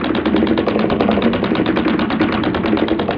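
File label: surface wood bridge loop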